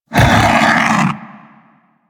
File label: Vocal Pain, critter; Warframe; Hunting Critters; Undazoa